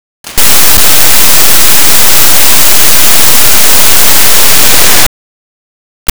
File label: Choir to Nave B format